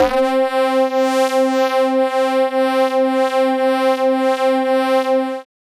single-synth, techno, c; Techno ID

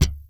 VALLEY FUNK, Bass; Spectrasonics; Bass Legends

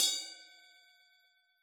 ride cymbal cup; AnlgKit1

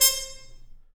BRS Harmon Stabs; Roland L-CD702; Harmon Amb

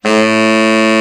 BRA TENOR, SAX; Best Service Gigapack 1; BR SAX 2

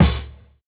Kick, jungle breaks drum sounds

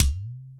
EQ Perc, Percussion Mix

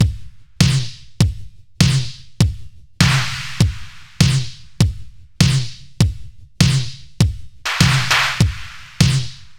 100 Beat; Blackout; Zero-G - Future Wave

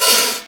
CYBER KIT, DRUMS

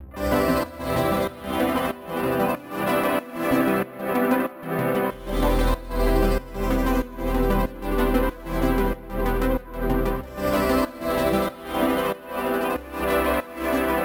A 94 FX; MELO + FILTER; RoBKTA Sample Pack Supreme

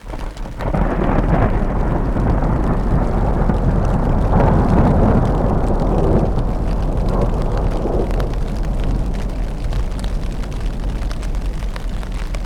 Weather, On The Glacier

